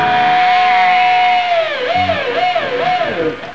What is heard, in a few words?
FEEDBACK